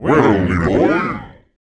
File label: VOICE FX, Dance Vocals